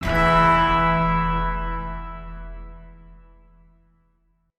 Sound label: Organ Chord Note E; Polluter Organ